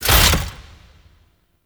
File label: Necramech Ranged Vault Down Land; Enemies; Warframe